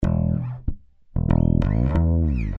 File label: Smack Funk Fretless; Loopology; Get Dirty